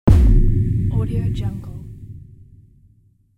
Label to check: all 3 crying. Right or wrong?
Wrong